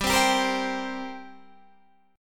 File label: G Minor Double Flat 5th, G, Guitar, DADGAD